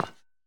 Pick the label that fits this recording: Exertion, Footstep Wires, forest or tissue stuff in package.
Footstep Wires